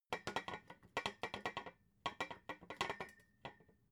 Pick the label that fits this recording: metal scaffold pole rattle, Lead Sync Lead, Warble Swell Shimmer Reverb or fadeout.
metal scaffold pole rattle